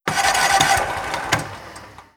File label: supply alert; Hearts of Iron IV Death or Dishonor